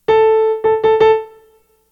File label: rhythm recognition